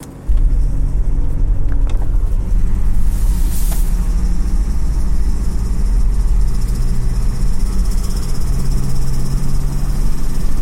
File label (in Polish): dziwne odgłosy z silnika; Terracan